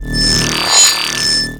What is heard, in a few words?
manhack loop blades; npc; Half Life Alyx